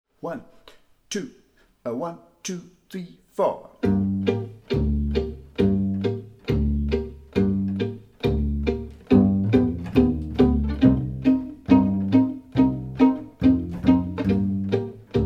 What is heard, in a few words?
Blues Mineur, backing track, minor blues